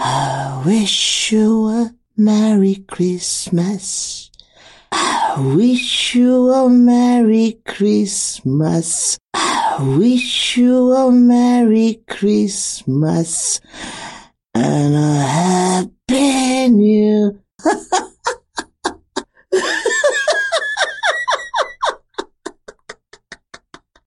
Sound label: Xmas Song